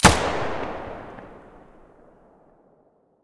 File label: muzzle; S.T.A.L.K.E.R.; IWP; vityaz; pp1901